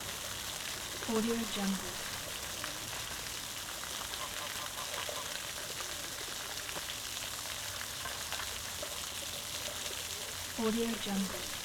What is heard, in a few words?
city park pond with birds and fountain